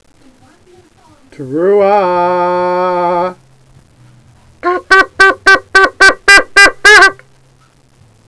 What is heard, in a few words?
teruah